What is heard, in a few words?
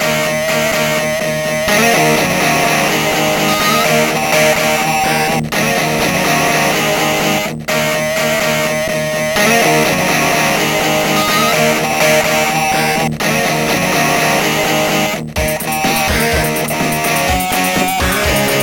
guitar riff